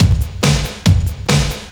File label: Breakbeat 140 BPM; Vengeance Samples; VEC3 Loops 140 BPM